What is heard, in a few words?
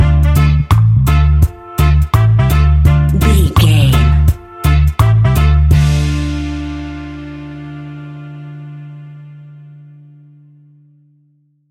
reggae music summer sun stinger; Reggae Music for Summer Sun. Classic reggae music with that skank bounce reggae feeling. Great for summer